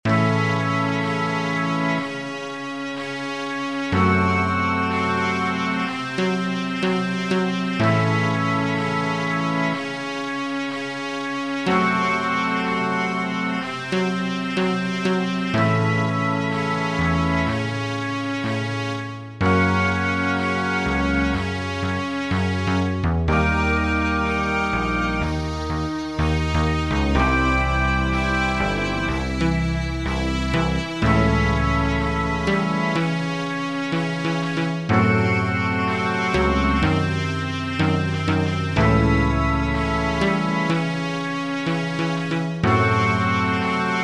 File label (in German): oktatonische studie